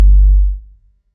logo reveal, impact; huashu design; sfx

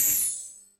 sparkle, magic; huashu design; sfx

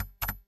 enter, keyboard; huashu design; sfx

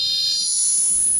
ai process, magic; huashu design; sfx

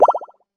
notification pop; feedback; huashu design; sfx

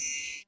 hover subtle, ui; huashu design; sfx

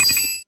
command execute; terminal; huashu design; sfx

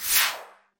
whoosh, transition; huashu design; sfx

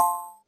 click soft, ui; huashu design; sfx

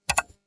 type, keyboard; huashu design; sfx

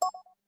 click, ui; huashu design; sfx

